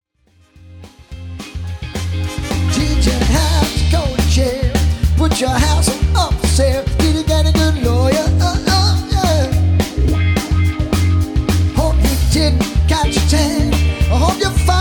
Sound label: Rock, LIVE